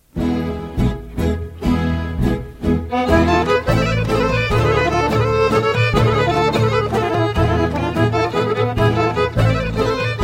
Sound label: Horo; Bulgarie